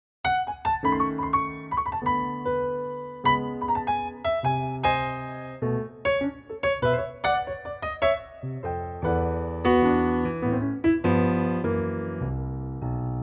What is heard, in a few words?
A piano